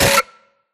Screen Glitch Short; Boomtown; Titanfall 2

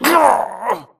grunt death neck; Titanfall 2

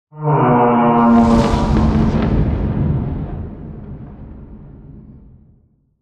Explosion Rumble Interior Close Wash Tail; Boomtown; Titanfall 2

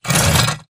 Stalker Damaged Crawlscrape Concrete; Titanfall 2

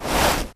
Grunt Mvmt FOL Soft Slow Cloth; Titanfall 2

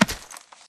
bodygrab; ai skit spectre bar fight; Titanfall 2

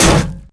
bullet heavyballistic titan; Titanfall 2

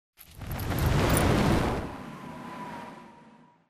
atlas eject pilot ascent foley; Titanfall 2

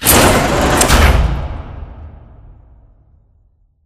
Assembly Wall Rail Close; Titanfall 2; Boomtown

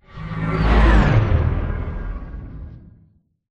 deploy from mega; Titanfall 2

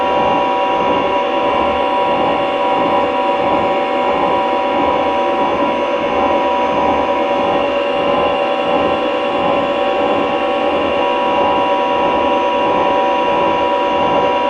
Prop Warehouse Prop Rack Small Move Close; Titanfall 2; Boomtown

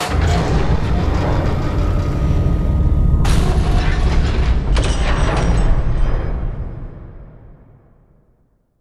Control Room Lift Down; Beacon; Titanfall 2

